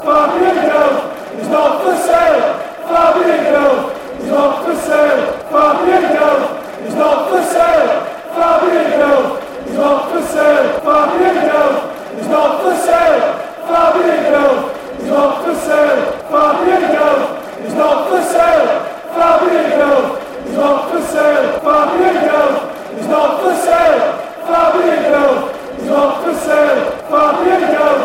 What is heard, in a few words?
fanchants; fabien delph